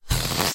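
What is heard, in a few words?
balloon explosion single short close muffled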